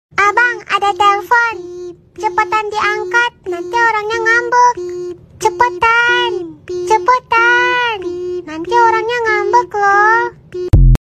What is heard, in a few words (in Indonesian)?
nada dering WA telepon masuk